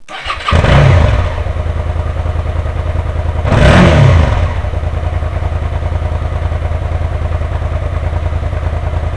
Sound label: SV650 idle, motorcycle